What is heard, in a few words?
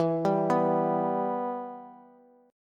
E-Minor, Bass; arpeggiated; JGuitar